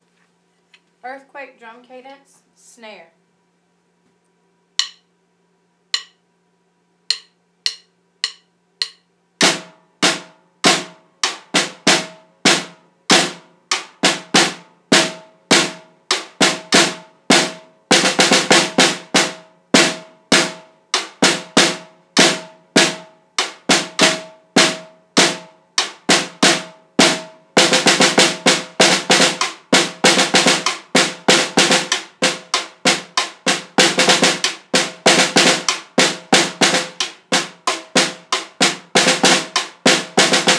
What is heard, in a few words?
drum cadence snare; earthquake